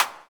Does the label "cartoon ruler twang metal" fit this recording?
No